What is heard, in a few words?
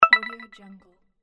ui chat